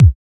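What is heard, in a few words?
bd, 808 bass drum; Best Service Gigapack 2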